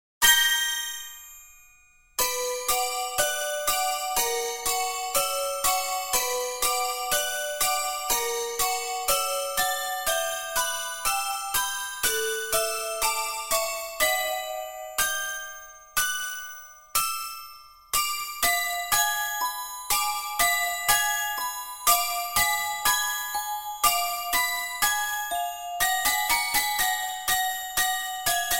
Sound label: melody of death ringtone